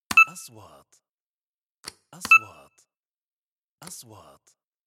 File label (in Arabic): نغمة كاشير, transitions